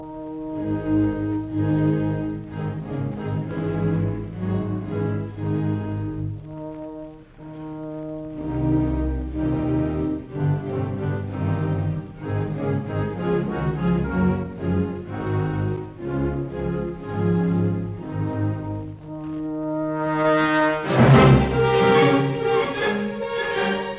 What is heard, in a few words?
Symphony in F major Allegro; Brahms; Op 90; Arturo Toscanini